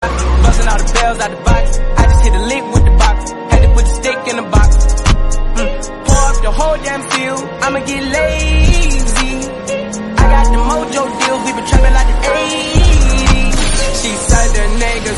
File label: bgm background music